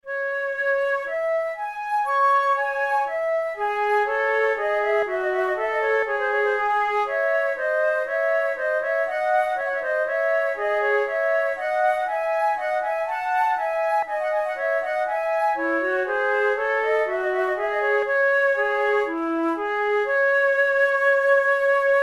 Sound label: prelude, flute